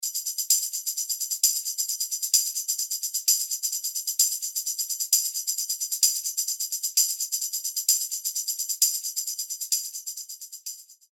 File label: Tambourine; Apple GarageBand 2; Apple Loops